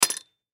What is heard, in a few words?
Gas Gun 37mm Load Canister; Load Chamber Insert Canister; Gun Shot; Warfare; Gun Shots